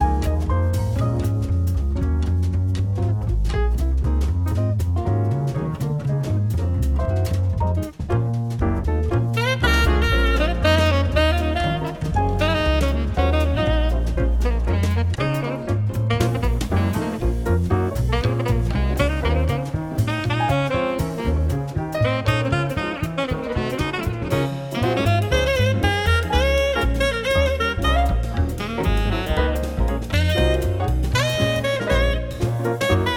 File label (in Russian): Музыка для чтения